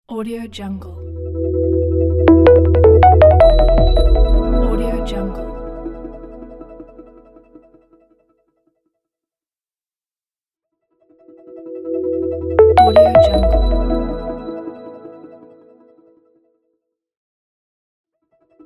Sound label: branding logo